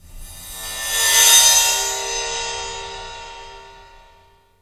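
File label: exotic cymbal; Greytsounds; EXOTIC GONGS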